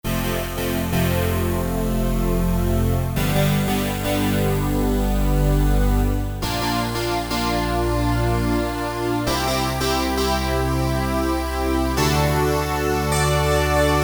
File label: THE SYNTH, Korg DSS-1; Multiple Inst